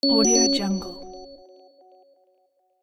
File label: correct answer notification